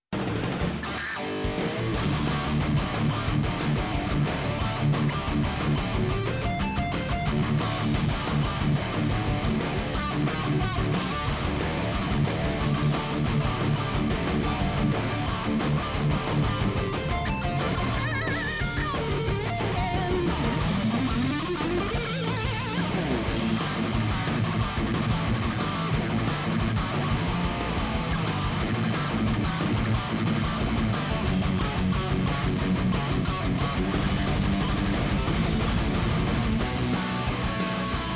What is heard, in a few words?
Heavy Metal